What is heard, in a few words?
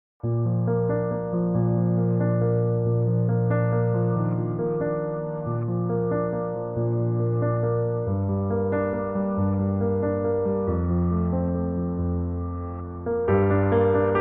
Mood Piano Short Rhythm